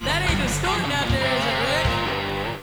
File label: Distortin